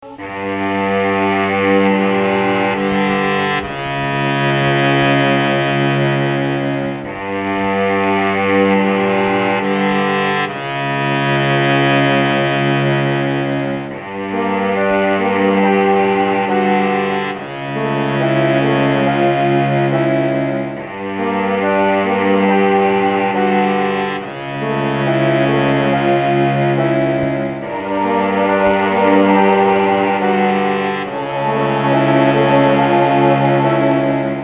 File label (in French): Ambiance Ermitage Abandonne